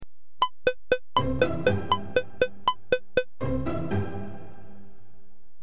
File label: Quaver Example, guitar; 3/8